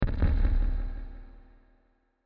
Deep SciFi Impact; Blasts; Impacts; Crafted Signals

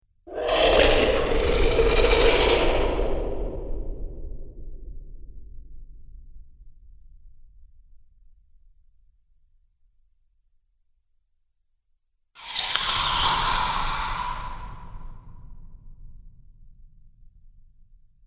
Two Menacing Monster Sounds

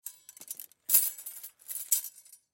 Croquet Gate Metal Pile Pick Up; SoundEffects-Blastwave; Sports

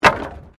Wood Fall

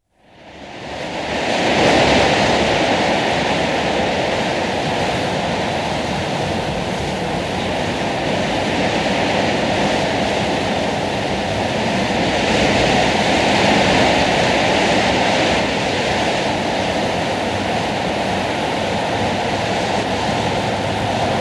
Wind in trees; Weather; BBC

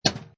Pinball Sound Chunky Clank; Video Games

